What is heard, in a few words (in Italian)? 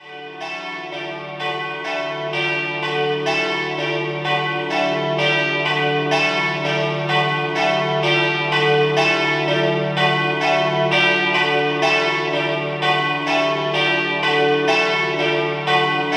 Pasiano, campane